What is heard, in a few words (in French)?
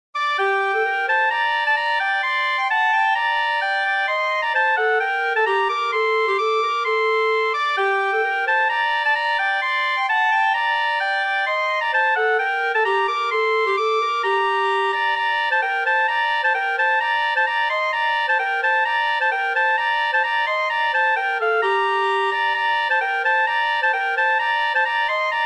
Bourrée; Bourrée d'Aurore Sand